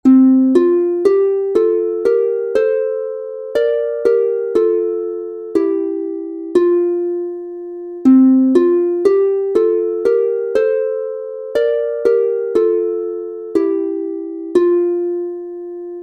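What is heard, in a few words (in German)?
F Dur 2 stimmig instrumental, Harfe; Sommer Herbst u. Winterlieder; Ein Maennlein steht im Walde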